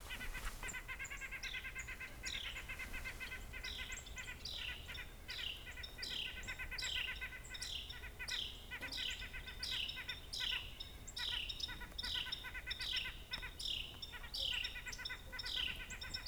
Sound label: white breasted nuthatch eastern